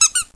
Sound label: rubber duck water; hedgewars